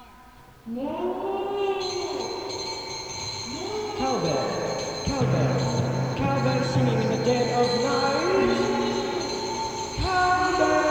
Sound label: Cowbell